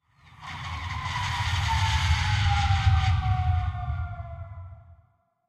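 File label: artillery projectile, tanks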